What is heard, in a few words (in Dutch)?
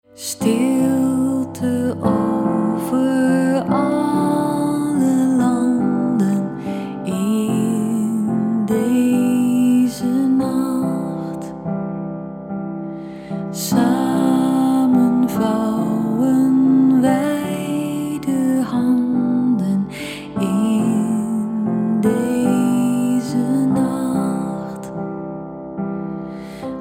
Stilte over alle landen Stille nacht